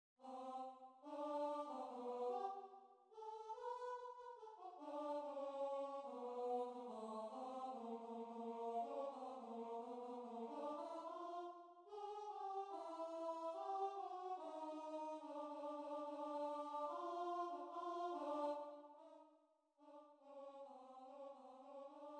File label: Voice